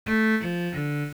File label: Close, earcon